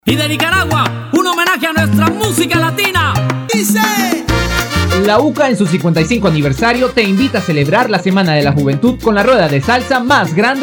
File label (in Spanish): rueda salsa; uca 2015